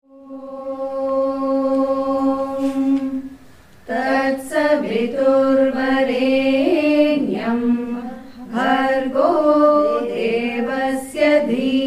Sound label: music vedic chanting; teachers of yogavahini school